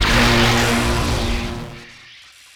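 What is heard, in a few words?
Laser Fire Interior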